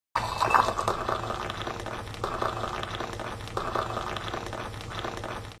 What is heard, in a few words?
Pouring Tea On Teacup; kitchen